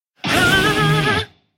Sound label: goblin guitar fire; Emote